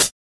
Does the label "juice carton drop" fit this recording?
No